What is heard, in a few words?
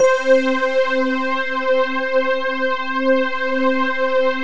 STELLAR PAD; Rubber Chicken Software Sample Bank; REAL INSTRMT